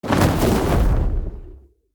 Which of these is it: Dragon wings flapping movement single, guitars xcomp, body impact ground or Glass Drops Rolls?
Dragon wings flapping movement single